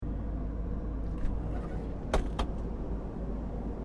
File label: AIRCRAFT BOEING 767-300ER CRUISING HEIGHT INTERIOR TOILET DOOR OPEN; Airplanes (Sound Effects:Vehicles)